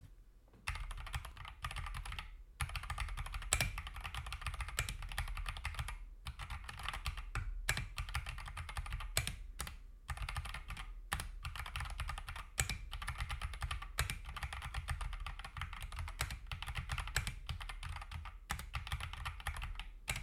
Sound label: akko 3061